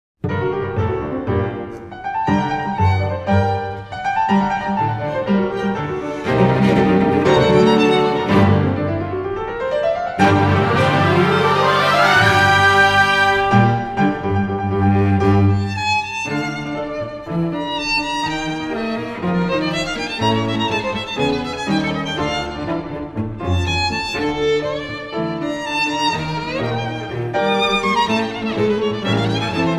Triple Concerto for Violin, Cello, Piano, and Orchestra